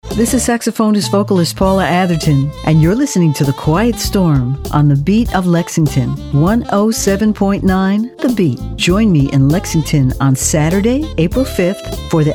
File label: SmoothJazz Quiet Storm; Artist Drops